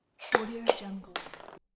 simple bottle opening; audiostew

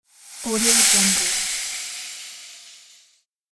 long woosh

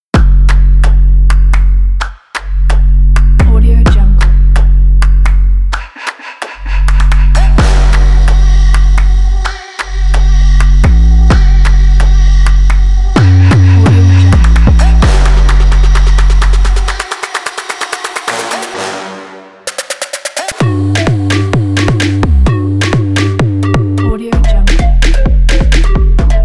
modern crazy commercial; musicbaker

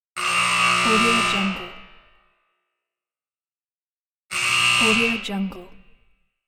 basketball siren

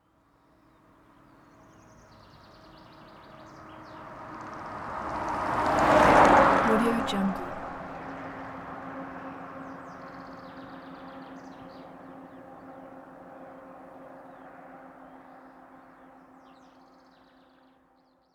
van passing by on empty road

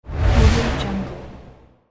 whoosh transition; archisound

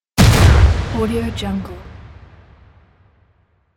strike; 3dhome

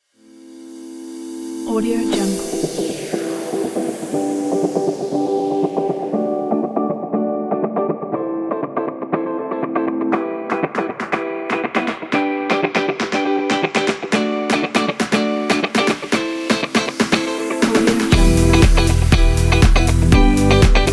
summer funky dance

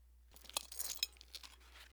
tap mug on ground

